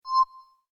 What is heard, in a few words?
Rollover, Short; Mouseovers; Multimedia; Rollover: Short, Effect